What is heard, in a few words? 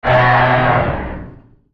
Godzilla Hit React; Godzilla Smash3